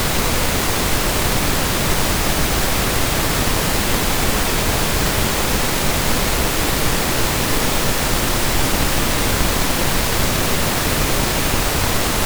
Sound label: Pink Noise